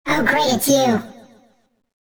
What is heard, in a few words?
alert; slender; roblox; coolkidd; princess